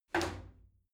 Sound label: shower slide door open hit soft